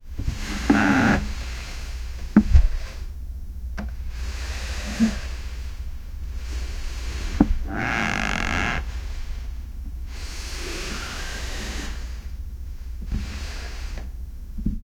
create a sound effect of, human interaction

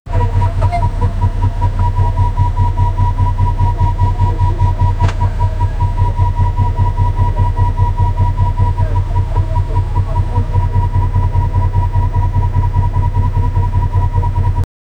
bus engine noise faint; mobile game

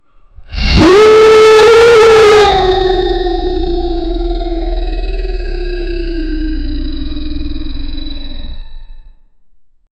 a piercing shrieking roar, dragon

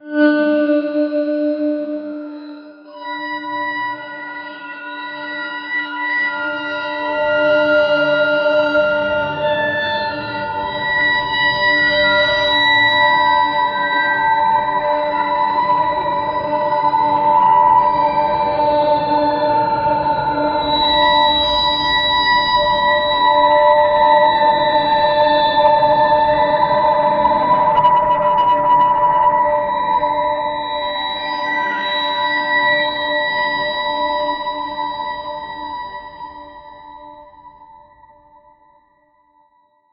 a pre hispanic ocarina; traditional instruments

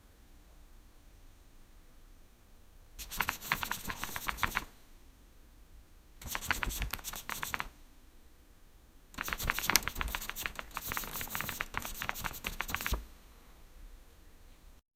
book flying in a circle

